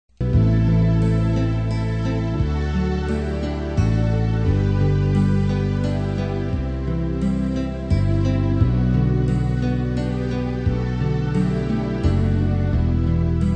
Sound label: BASS